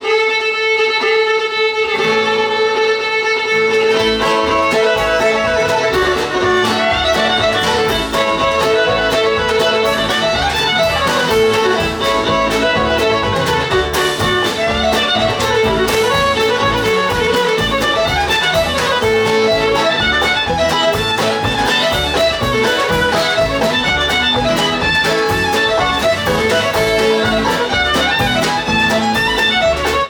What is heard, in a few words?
musicbox